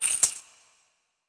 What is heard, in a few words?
reward; World of Tanks Blitz; GUI combat missions